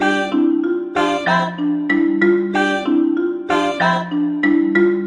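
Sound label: mallets voice, 95 bpm; melodic loops; synth and electronic loops; steve reich like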